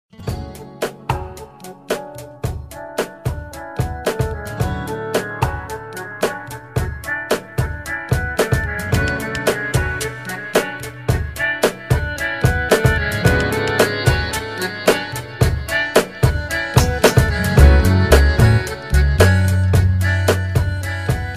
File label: Rumba; Rumba with a Tango complex